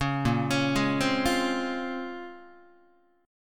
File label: Bb Minor 9th Guitar, chord; Bbm9; arpeggiated; 4,6,4,6,4,4; JGuitar